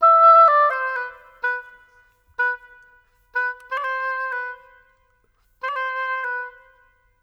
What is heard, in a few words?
Oboe, Orchestral; Sonic Foundry (Sony Creative Software); Orchestral VOL-2